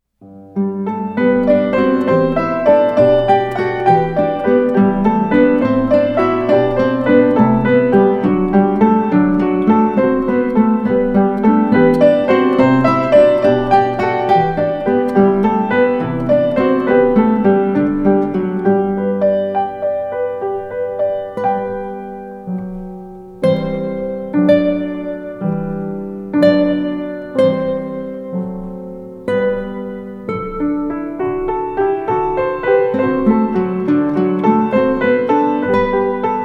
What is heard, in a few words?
classical guitar; Jesu Joy of Mens Desire; Bach